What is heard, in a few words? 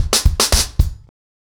110BPM, acoustic drums, drumloops; The Roots Drumloops; Zero To Ten